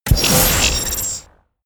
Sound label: sci-fi click generic confirm light, hits impacts